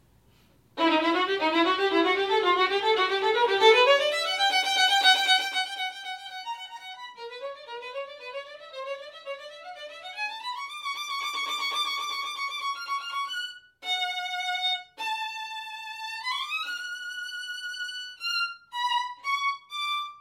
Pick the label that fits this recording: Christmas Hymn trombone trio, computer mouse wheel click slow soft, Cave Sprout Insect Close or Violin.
Violin